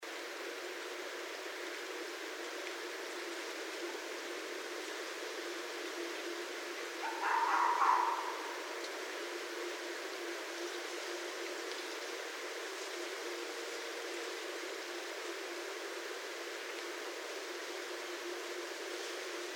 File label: Red Fox